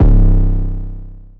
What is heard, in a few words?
urban trap kick; Urban Trap Kit; Hip Hop; STYLE KITS (Hip Hop, Etc)